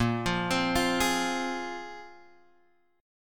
A sharp 7th Suspended 2nd down Guitar Open G

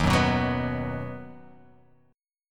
D sharp Minor Sharp 5th; x,6,4,4,6,6,x,x; chordshape

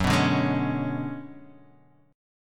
B Minor 6th Add 9th F sharp

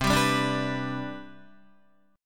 C Major 7th Suspended 2nd, C, down, Guitar; strummed; CM7sus2

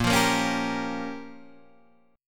Db 6th Flat 5th Bb

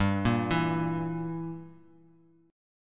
G Minor 6th; Gm6 arpeggiated; JGuitar

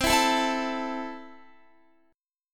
C 6th C down Guitar; strummed

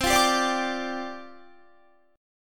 C Suspended 2nd Suspended 4th, down, Guitar

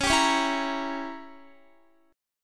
Db Diminished 7th Db down Guitar; strummed

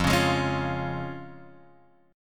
F Minor Sharp 5th, Guitar Drop D, chord

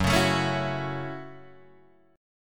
F sharp Minor 7th F sharp, Guitar Open D

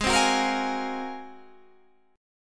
G sharp 13th, Guitar, Standard; strummed; JGuitar

